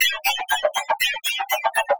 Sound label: 120BPM LOOPS; Bolder Sounds - Granular